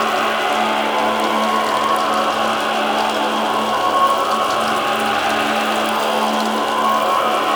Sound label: shrill idle loop; Gameplay; Loot Crates; Warframe; Entrati